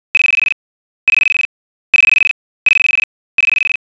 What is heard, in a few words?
insects